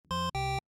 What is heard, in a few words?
error; BTCPayServer